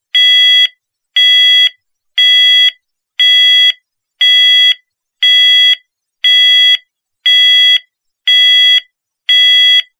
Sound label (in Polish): ton